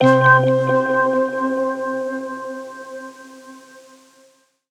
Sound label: synth note bell thing C; Virtual Riot; Synth Notes & Chords